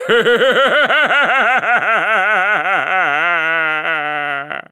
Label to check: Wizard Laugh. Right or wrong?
Right